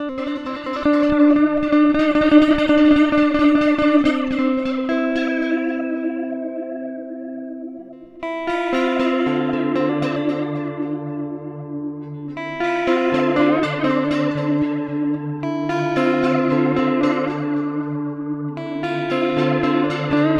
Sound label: Starlight Echo Station Precision A